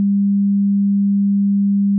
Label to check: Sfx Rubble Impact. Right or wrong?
Wrong